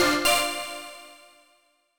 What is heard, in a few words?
Weak Point Hit Animation Explosion; Super-Robo-Squad